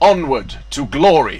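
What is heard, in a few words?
attack; NWNDS; SrArtus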